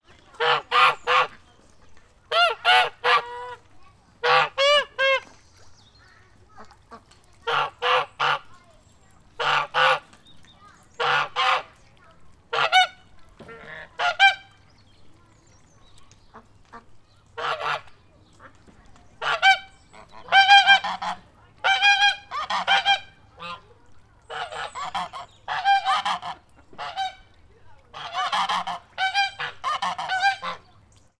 birds geese honks public farm people in background uk; sound effects one